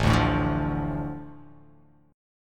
A Suspended 4th Sharp 5th

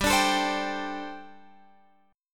G Suspended 2nd Suspended 4th, Guitar Open F; Gsus2sus4; strummed

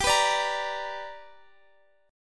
B Minor Major 7th Sharp 5th

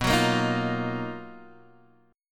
B Major Sharp 11th, B, down, Guitar Standard; BM#11; strummed; JGuitar

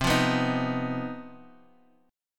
C Minor Major 7th Double Flat 5th, Guitar, Drop D; down; chord shape

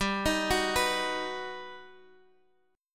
G Augmented Major 7th, Guitar, Half Step Down

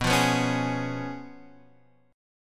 C sharp 7th B

